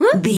voice fun small character emote surprised

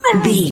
voice male hurt pain

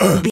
gorilla hurt

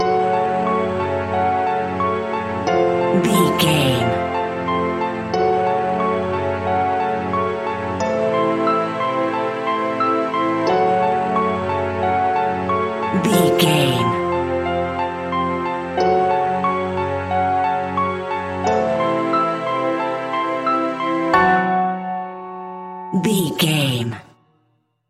modern horror; Video Game

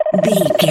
cartoon electronic computer code

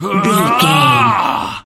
voice male battle shout

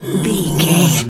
bear pain hurt groan

